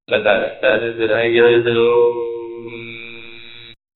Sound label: right answer, button